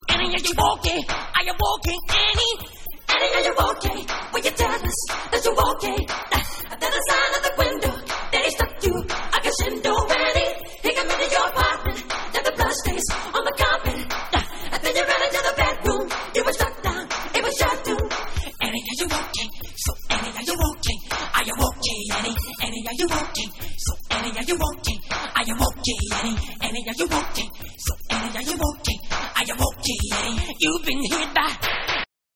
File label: Acappella